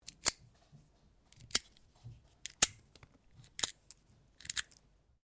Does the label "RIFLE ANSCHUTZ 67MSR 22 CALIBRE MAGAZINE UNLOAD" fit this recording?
Yes